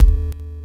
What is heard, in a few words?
Loop Bit, Processed Percussion; One Shots; Sonic Foundry (Sony Creative Software); Rhythmicronics